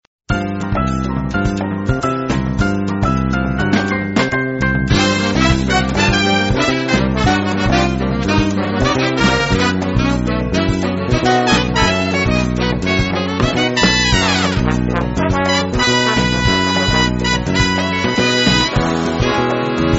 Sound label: credits afro cuban version